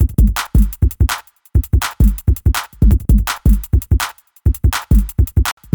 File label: More Treble; Shinechilla; DaZone